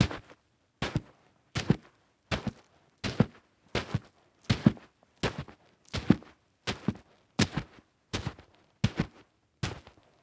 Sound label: Foley Footstep Work Boots Dirt Debris Walking; Foley Footsteps